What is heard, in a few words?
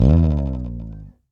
Bass slide; Rock